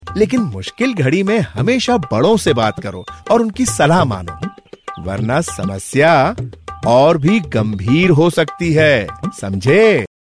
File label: Bansuri